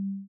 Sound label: enemy defeat